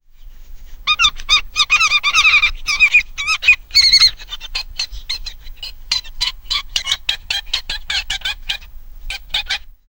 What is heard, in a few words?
a cute penguin running on, arctic